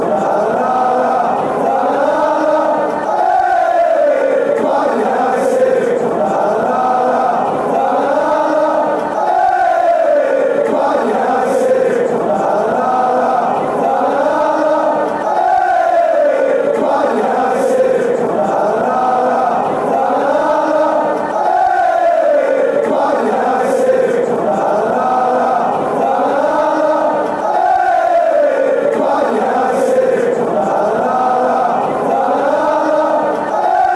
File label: la la la la